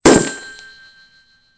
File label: phone shot; Soldier Of Fortune II Double Helix; XBOX